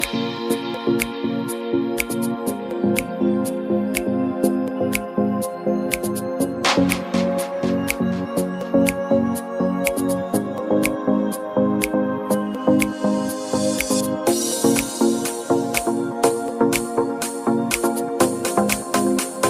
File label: dj english song ringtone